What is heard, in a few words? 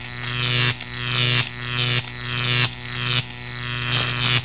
HI VOLT